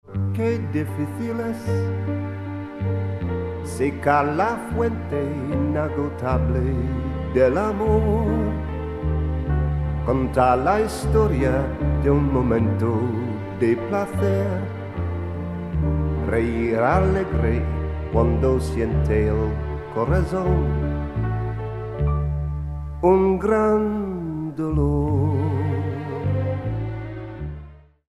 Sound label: Historia Restored Vinyl